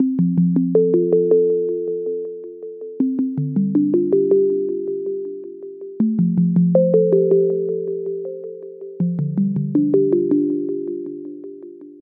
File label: Alarm relax version, alarms; Meizu